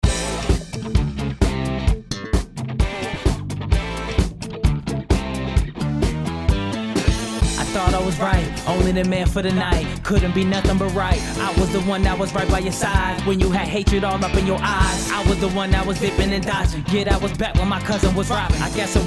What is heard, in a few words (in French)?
univers rap